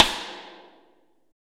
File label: rim; Best Service; Real Mega Drums VOL-1